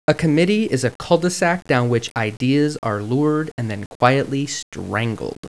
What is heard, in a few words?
strangle; Killer Content Workbook